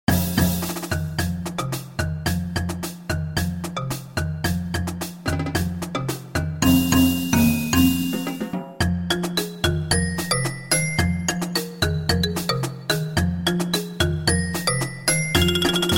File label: ballistic brass, drums